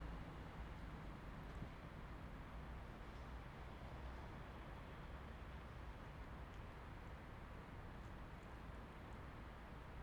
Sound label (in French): sortie travail - Amb ville Angers; ZoomH2N